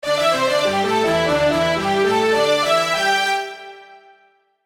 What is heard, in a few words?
jingle; maoudamashii